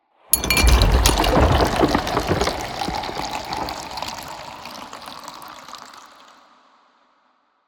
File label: pour; sonniss gdc